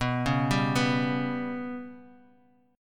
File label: B Suspended 2nd Sharp 5th, Guitar, Half Step Down; Bsus2#5